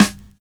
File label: SD, snare, 70 s Breakbeats